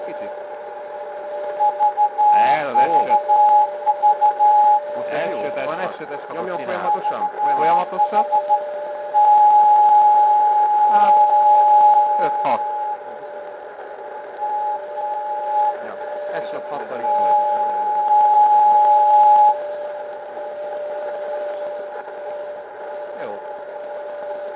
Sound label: cw tx